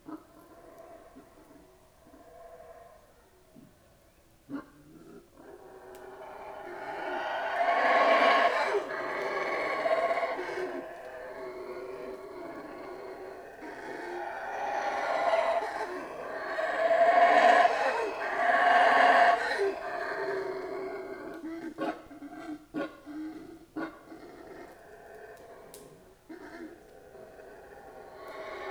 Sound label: Howler monkeys; It was a good thing that it was a sunny day and the young howlers looked so cute.; jungle in the ancient Mayan city